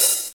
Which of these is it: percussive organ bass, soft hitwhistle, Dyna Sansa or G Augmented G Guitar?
soft hitwhistle